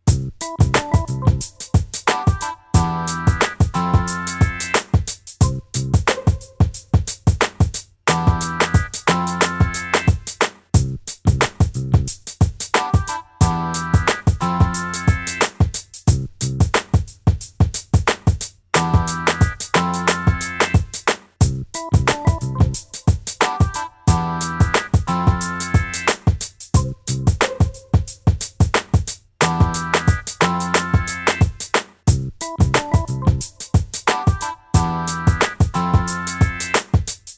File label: HipHop